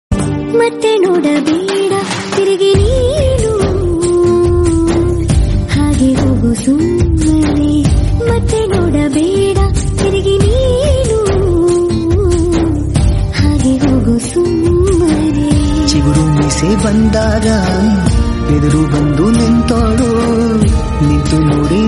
kannada song

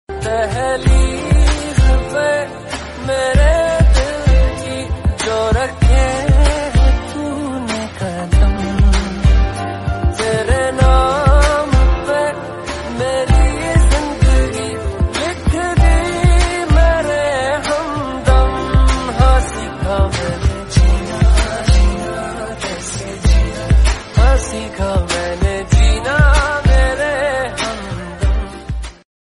romantic, punjabi

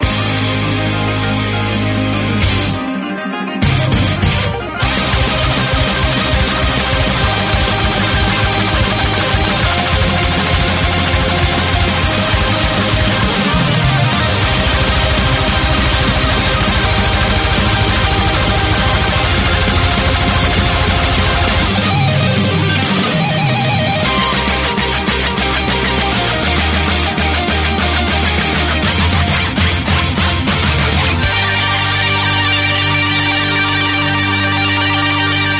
instrumental music

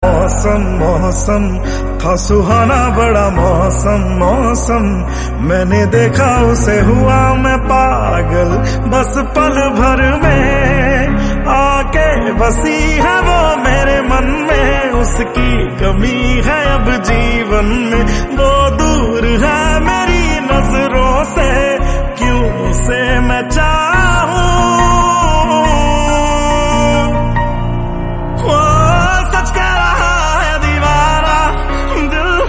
hindi song

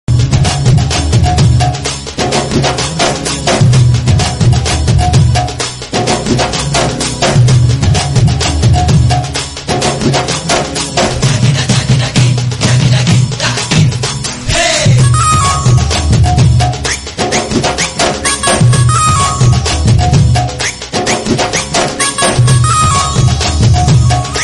sambalpuri theme sambalpuri instrumental music

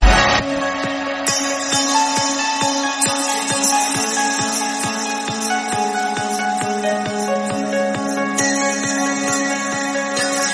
dj remix; bajrang dal hubli

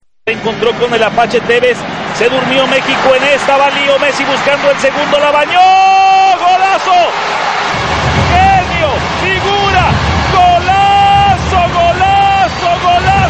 english song

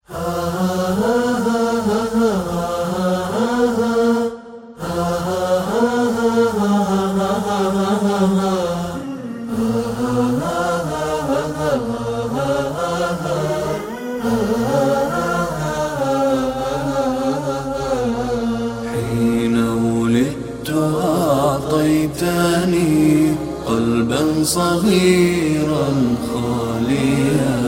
nasheed

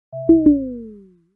realme low battery sound notification

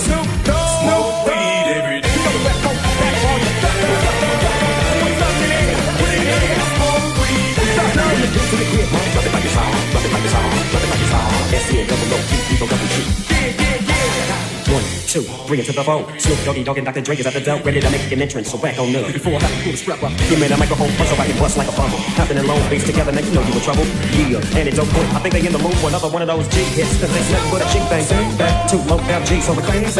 remix

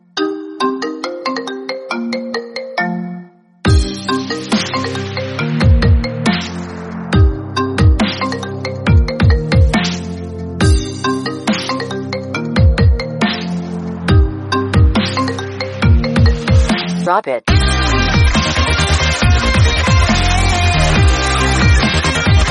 iphone ringing remix

